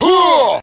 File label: huh, Voice